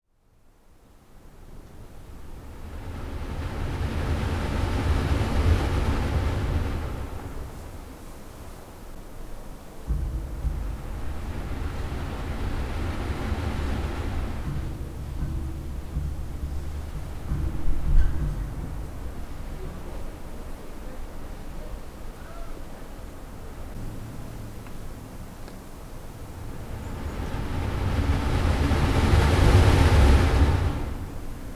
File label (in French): La voix de la mer Sagres Portugal